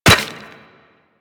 Run Bone, Footsteps; Warframe; Operator

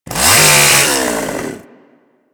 Ghoul Sawman Swipe; Warframe; Grineer Ghouls

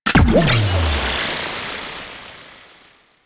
Focus Boost Pickup; Warframe

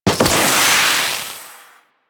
Flying Spaceman Jetpack Burst; Warframe; Venus

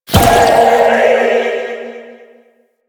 Spirit Primary Fire H; Warframe; Grineer Thumper Bot; Sentient Spirits

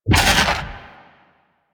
Fairy Dust Impact; DE; Warframe

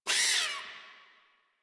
forest rodent vocal pain; Characters; Warframe

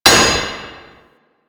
Worker Hammer Impact Metal, weapons

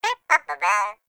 Flying Robot Voice, Ambience; Warframe; Veilbreaker; Kahl Quest

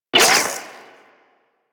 Shot, Auto Crossbow; Warframe; Tenno; Prime Ballistica

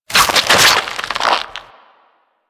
Ghoul Devourer Hook Tongue Projectile; Warframe; Grineer Ghouls

